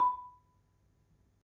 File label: Marimba, B3; Terkelsen